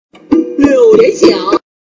sound water compare point

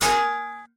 Pan

